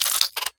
steampunk landing; smite; serqet

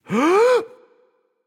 breath; smite; susano

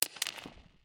death candy drop; smite; chaac

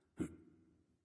lobby victory; smite; chaac

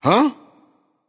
confused; smite; vamana

